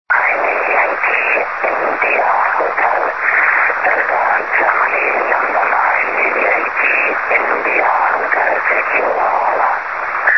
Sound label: 2m Aurora; DG9YIH